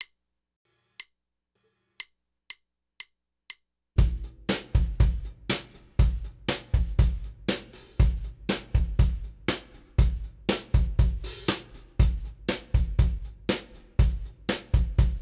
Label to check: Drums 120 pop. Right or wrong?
Right